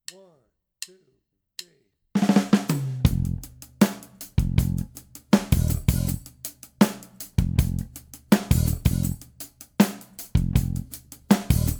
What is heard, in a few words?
groove